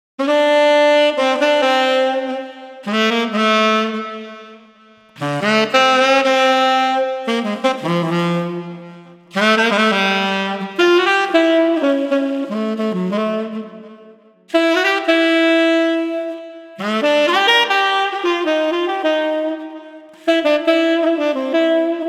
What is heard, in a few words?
Tenor Sax Languid 100